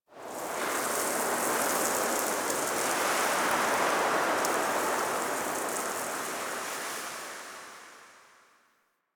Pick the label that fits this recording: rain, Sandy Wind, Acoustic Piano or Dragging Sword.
Sandy Wind